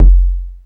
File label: bass drum deep, techno trance drums